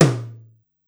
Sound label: Tom Mid, Korg Wavestation-SR Drum Kit; Wave 455 - Drum Kit